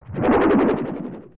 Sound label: Parasitic Tentacle Swing Back; OOT